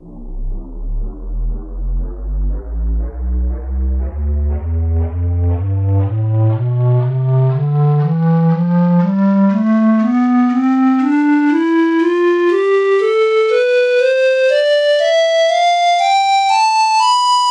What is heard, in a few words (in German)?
pan flute tonleiter samples